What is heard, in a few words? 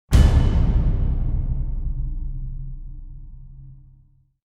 Trailer Hit